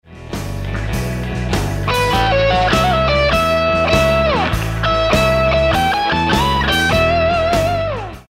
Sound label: Lick, guitar